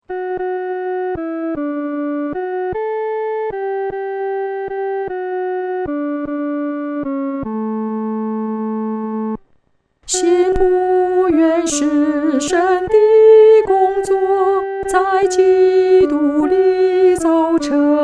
独唱 第二声; 信徒原是神的工作

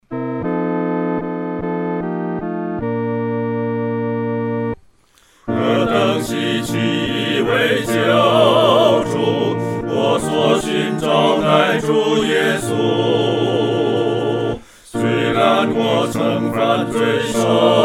合唱 四声部

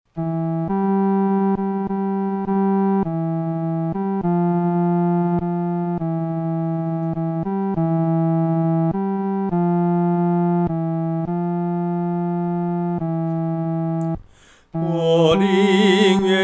独唱 第三声

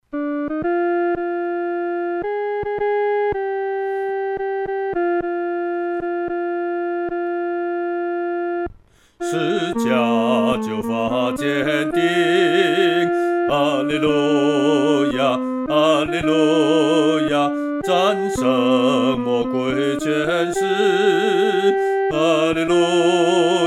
哈利路亚归十架 独唱（第二声）

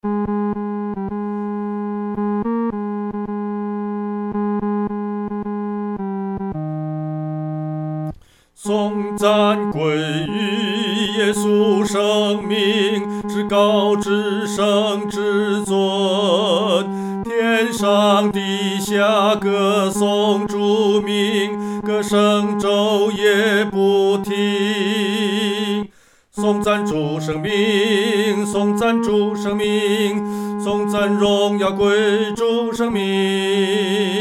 独唱 第三声; 颂赞主圣名

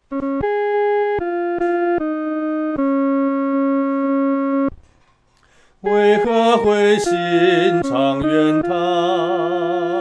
独唱 第一声

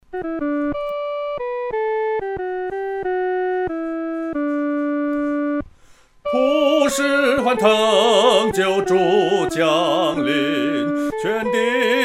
独唱 第一声

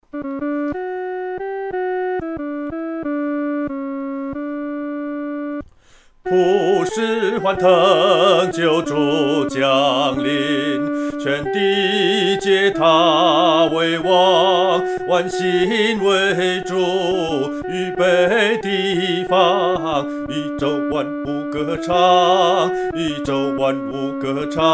独唱; 第二声

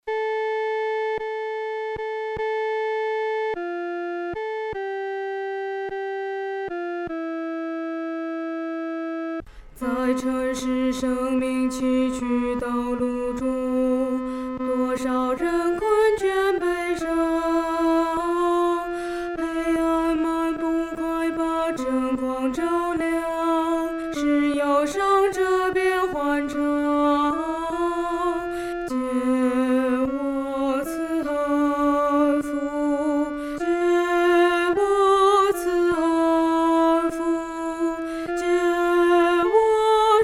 独唱（第二声）

